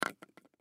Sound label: Coffee Creamer; Coffee Creamer Package Empty Set Down Light Wobble Wood Table; Drink Mixing; Foley; Blastwave